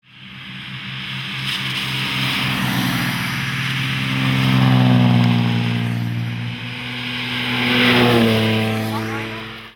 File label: BMW 328, oldtimer